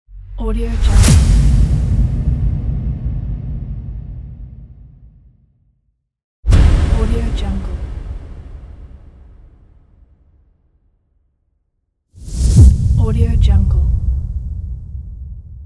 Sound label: whoosh impact